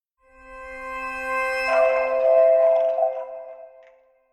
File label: Simple Clean Logo